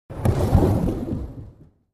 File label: Bowling Ball Rolls